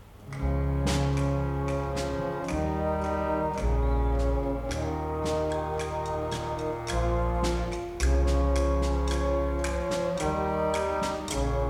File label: Instrumentals